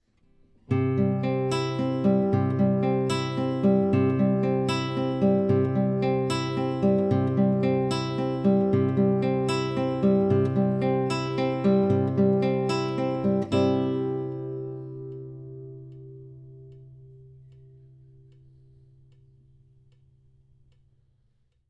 C Maj, guitar

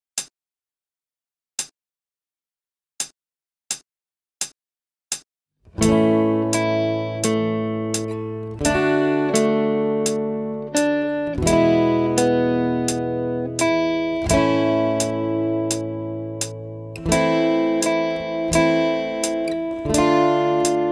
3 Strings fast, guitar

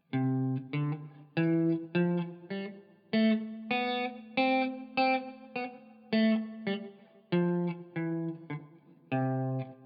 C Maj Scale Bad, guitar

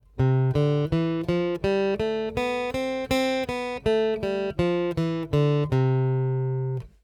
C Major Scale, guitar

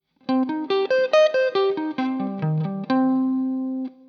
C Minor Arpeggio Shape, guitar

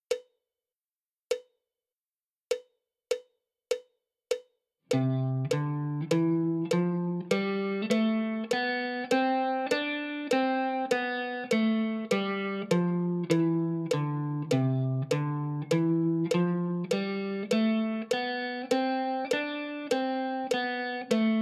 C major exercise, guitar

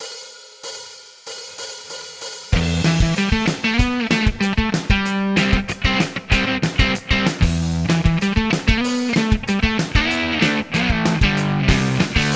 E Minor Funk, guitar; Onlineguitarbooks

